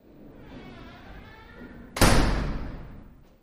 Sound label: Door Metallic Hvy Cl